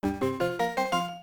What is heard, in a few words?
jingle